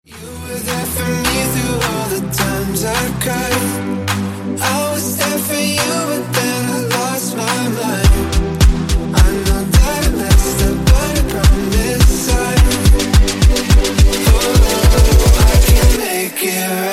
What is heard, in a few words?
edm remix